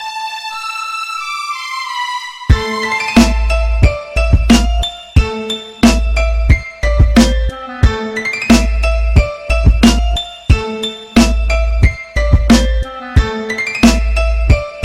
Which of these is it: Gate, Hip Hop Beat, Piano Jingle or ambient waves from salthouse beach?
Hip Hop Beat